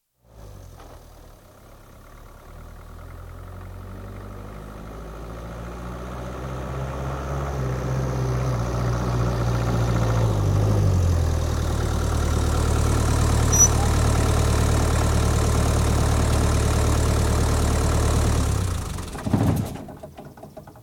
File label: Land Rover, exterior, approach & engine switched off (Land Rover, Long Wheel Base, 109 Safari Diesel, Series 2A, 1967); Cars; BBC